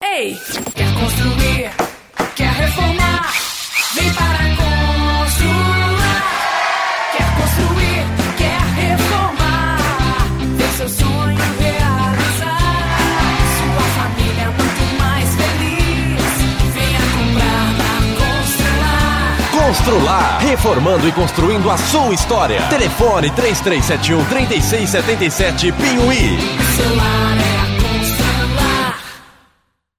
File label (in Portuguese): Produtora de Jingles